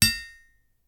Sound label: Drinking Fountain; Rabbids Multiverse